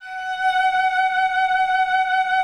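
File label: F#5, cello vibrato; Xsample VOL-7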